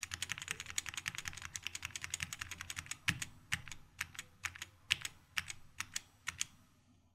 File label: Gateron Switches Sound Test Red Blue Brown Yellow White Black Green